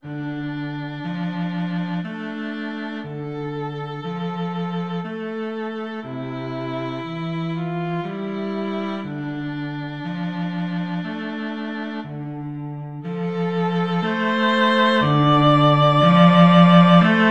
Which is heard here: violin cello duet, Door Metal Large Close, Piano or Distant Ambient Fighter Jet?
violin cello duet